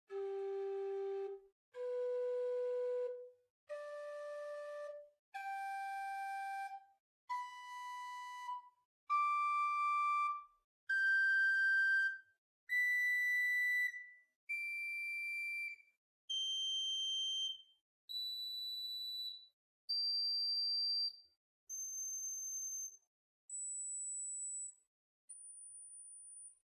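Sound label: Quinte 1-1.3 arpeggio, Chamber Organ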